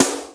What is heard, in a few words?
SNARE, Skanky Perc; Hip Hop; E-MU Formula 4000 Series Vol. 1; Hip Hop Nation